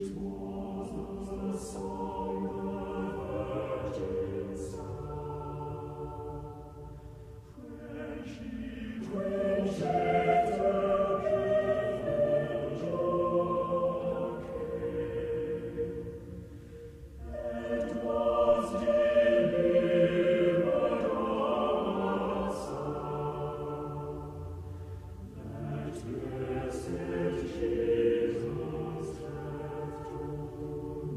Lute-Book Lullaby; Traditional

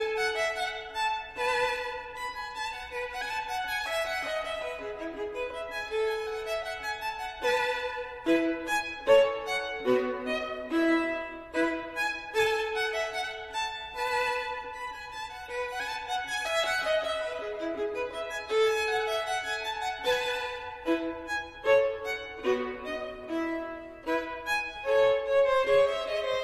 Gavotte en rondeau, Violin

